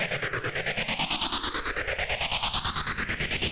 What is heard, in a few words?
Arp 136bpm, Trance; Arps; Zero-G Trance Inducer; Arps 140bpm; 90 s Sample CDs